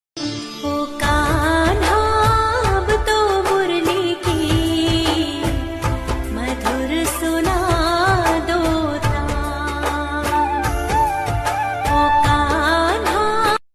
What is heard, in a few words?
bhakti ringtone; radhe krishna